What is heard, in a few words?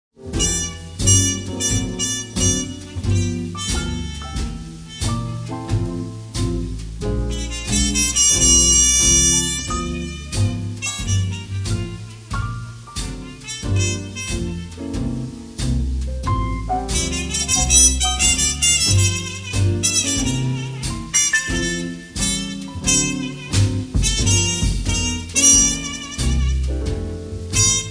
Jazz Rhythm